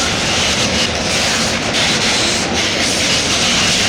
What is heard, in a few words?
ball lightning loop; storm spirit